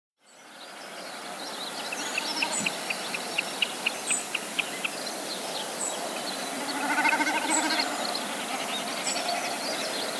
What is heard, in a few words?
Snipe